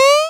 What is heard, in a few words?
Jump; Five Nights at Freddy's; FNAF 3